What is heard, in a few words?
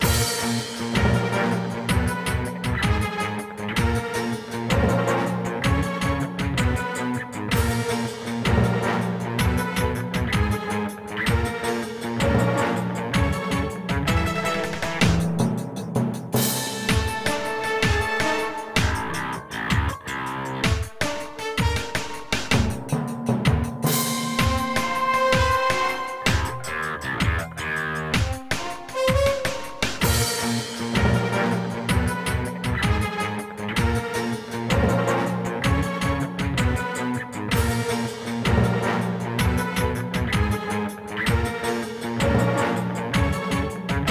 Base Battle LOOP